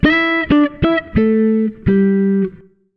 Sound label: FK SOLO, Jazzistic; FNK